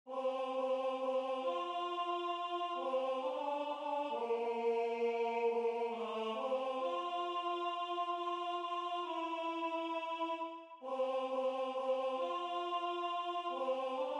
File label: Alto